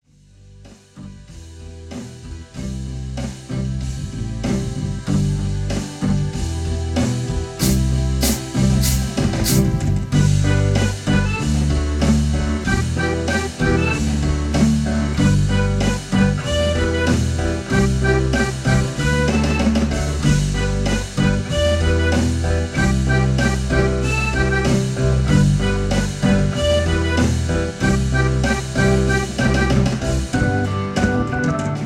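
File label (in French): canette trop chouette, Version instrumentale avec ligne mélodique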